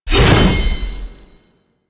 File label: Sword Swipe; Warframe; Weapons; Melee; Whooshes; Ballas Sword